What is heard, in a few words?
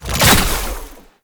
Pickup Dispenser Ammo; Tau; Twelve Minute War; Pickup Dispensers